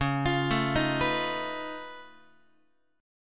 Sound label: C sharp Augmented 9th, C sharp, down, Guitar; arpeggiated; 4A,4E,5A,5D,5G#,6C#; C#+9; x,9,8,7,7,10; JGuitar